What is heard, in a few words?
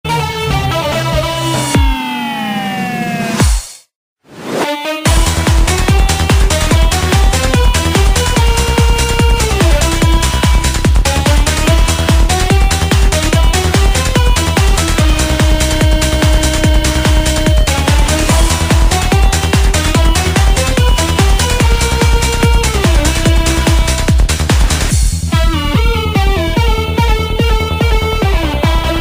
electro